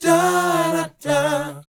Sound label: BOYZ B, Vocal Planet R&B & Dance; ILIO